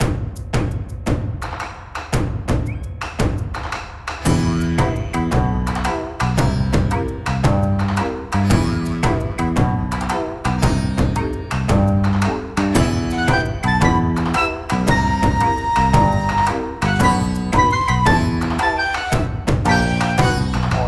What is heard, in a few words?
bon odori; Yokai Watch 1